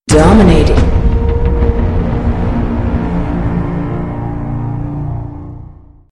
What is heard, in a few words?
music stinger; killstreak music; UT2K4